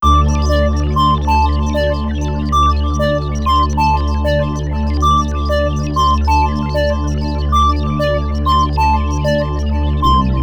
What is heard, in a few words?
Meditation Alarm; Sony Ericsson; PlusFourSix; Twiggy; Lars Edvall